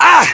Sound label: pain